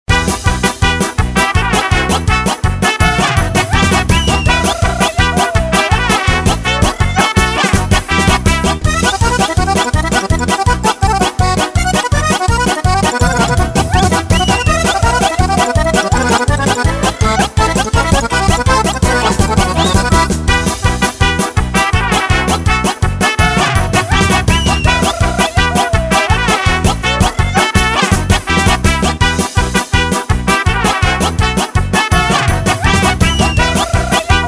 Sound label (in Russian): Polki instrumentalnye